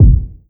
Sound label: Kick